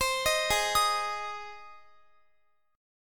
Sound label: Ab Major Ab down Guitar; Ab arpeggiated